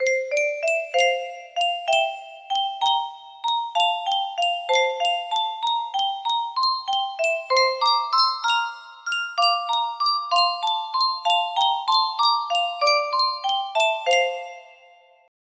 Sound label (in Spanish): yunsheng caja de musica